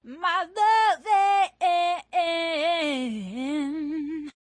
diva my luv uv uv uv uv in, Cheesy Divas, vocals

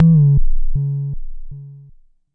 Jomox MBase, drum machine; Jomox MBase 01

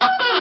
vintage door squeak; Cartoon Sound Effects Kit

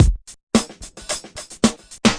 sparse cracker break, 110 bpm, drum loop; 101 to 110 bpm

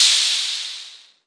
mrk hh, Maestro Rhythm King drum machine

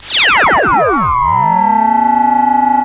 scifi mega blast; Computery or Science Fiction

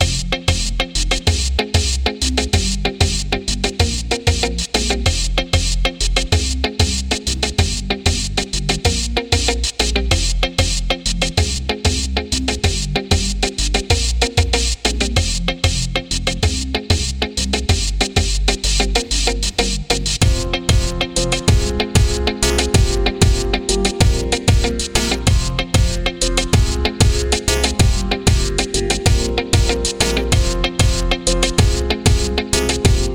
Dance Hall

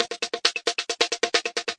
134 clicky echo perc, drum loops and breaks

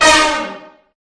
BRASS HIT; Punches Hits Discoblasts; single hits

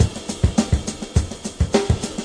104 steaming along, drum loops; 101 to 110 bpm

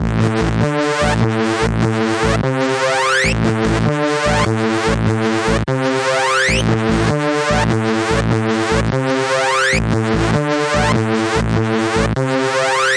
148 breeding bass synth

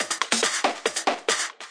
140 techno funk snares, drum loops and breaks

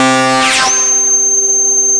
Synthesizer Middle C Notes, Lead; multisampled; synth keys

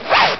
Zipper

